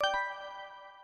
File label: gameover